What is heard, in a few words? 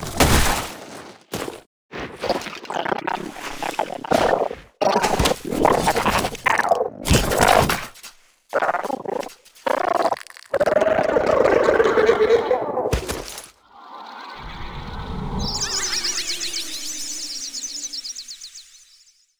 crab boss outro; Donkey Kong Country Returns HD